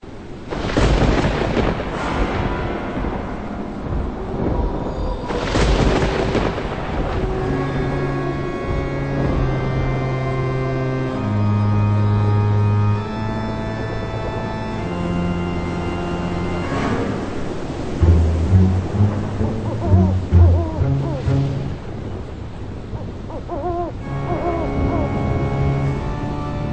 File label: Dark Castle Instrumental Amb; ios ps4 switch windows; game rip; mahluk dark demon